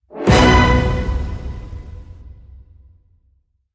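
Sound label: Hit Orchestral Glam B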